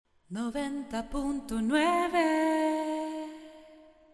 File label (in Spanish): dial cantado 909; 90 punto 9